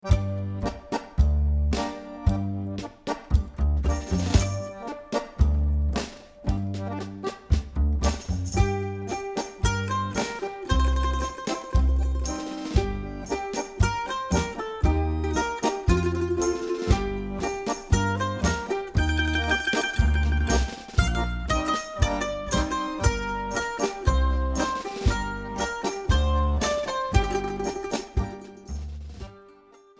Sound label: Instrumental